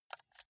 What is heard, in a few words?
console controller arrow key press slow